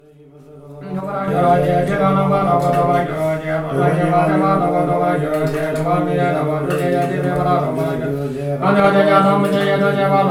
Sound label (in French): Piere au temple bouddhiste de Kobang